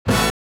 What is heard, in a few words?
last chord stimulus only